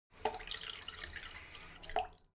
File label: elements water sink fill splatter light short; smartsoundfx